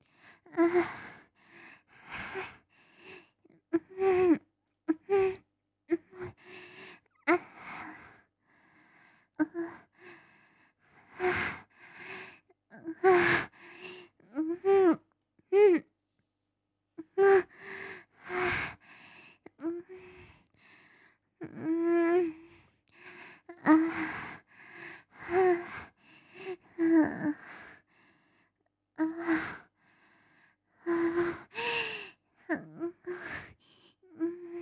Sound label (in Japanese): 喘ぎ声 極小